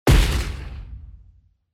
desert eagle

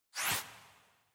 UI comform sound

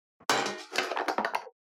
metal texture

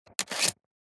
Reading bow

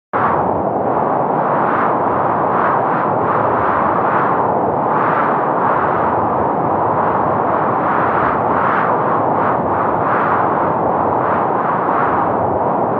tornado

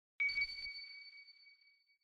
UI comform sound

